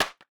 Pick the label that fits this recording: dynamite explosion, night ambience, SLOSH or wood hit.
wood hit